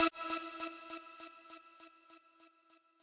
lead

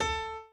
piano chord

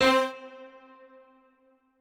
piano

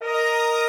strings

